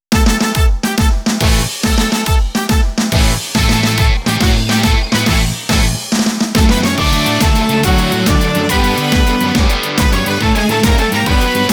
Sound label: Play Ball; Family Stadium 2020; Famista